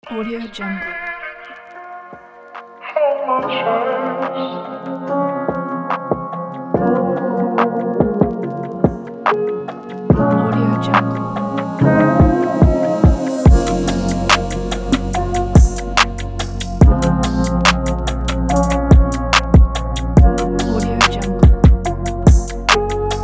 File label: urban street hip hop beat